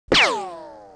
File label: alien Fly; Jungle Rumble